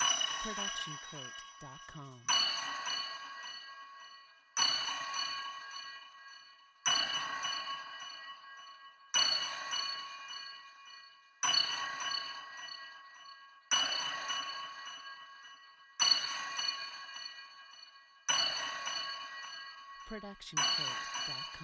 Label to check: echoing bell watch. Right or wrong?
Right